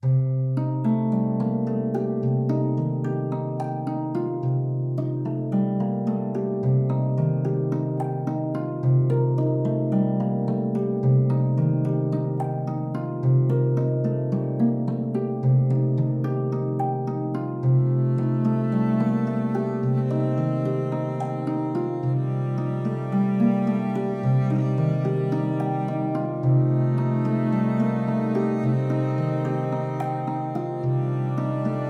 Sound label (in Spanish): arpa; La norma. Interludios para arpa y viola. Variación I